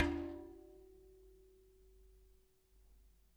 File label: Bicycle Spoke